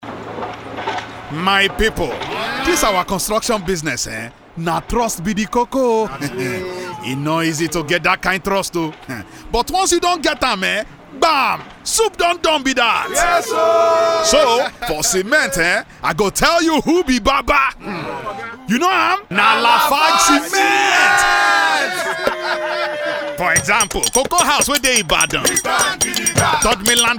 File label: Cement Jingle; LAFARGE